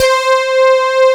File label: PAD, SYN PAD